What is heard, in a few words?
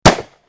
unload, weapon; weapon paragon; izanagi 2022